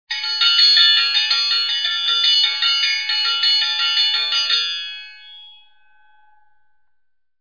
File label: Triangle